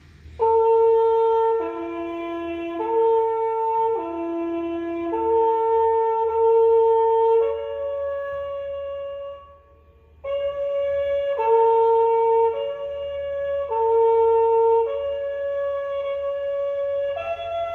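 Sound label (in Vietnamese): Nhac chuong bao di ngu trong quan doi, army sleep call ringtone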